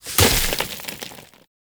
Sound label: Animal Despawn Dirt Dive; Hunting Critters; Foley